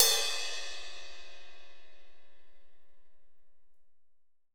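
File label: 16 RIDE, AMBIENCE RIDE CYMBAL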